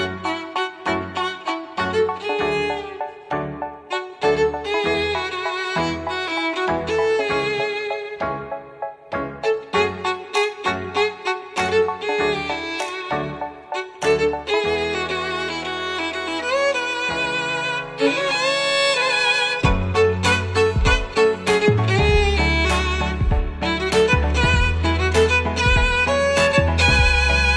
Violin, tono de llamada

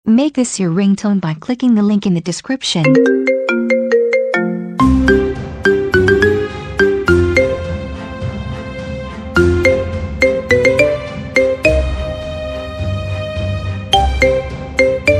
Marimba

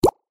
Xiaomi Notification, tono de llamada

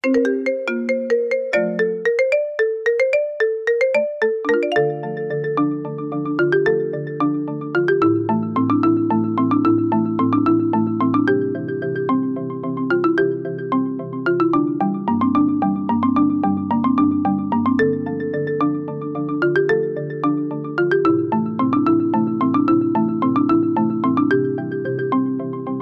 iPhone X Marimba

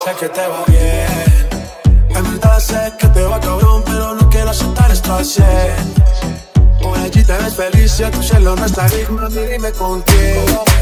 Remix, tono de llamada

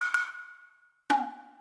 Japanese Drum

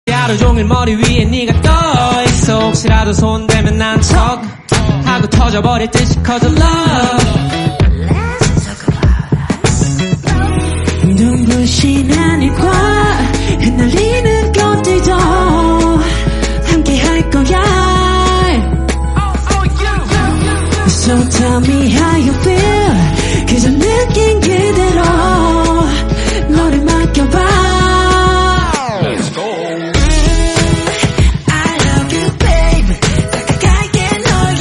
Marching Band

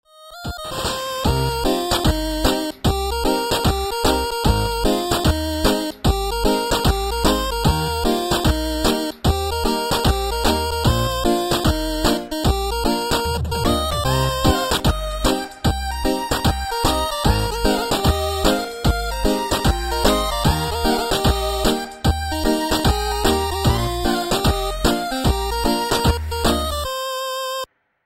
8 Bit

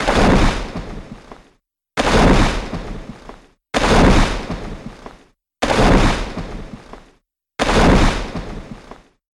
robot walk

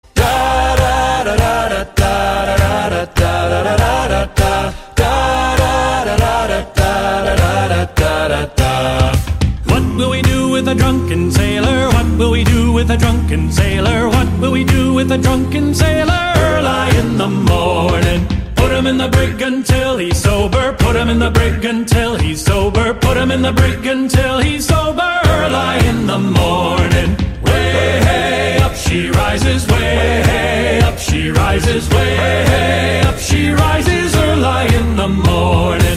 Sea Shanty Medley

